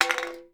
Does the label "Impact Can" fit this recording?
Yes